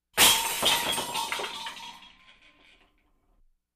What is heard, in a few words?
Tray of dishes dropped; Technology